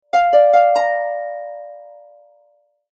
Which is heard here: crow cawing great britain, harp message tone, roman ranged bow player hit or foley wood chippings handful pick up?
harp message tone